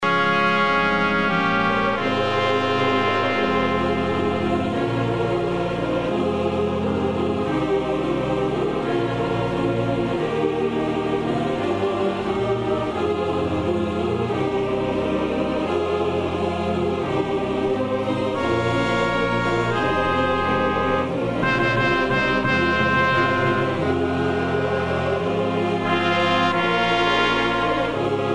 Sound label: FIRE Synth